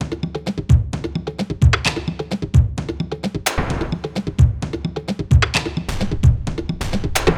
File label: Perc Loop 130 Distressed; Drums; Percussions; Loops; Ghosthack; Drum Mega Bundle; Abstract Percussions Volume 4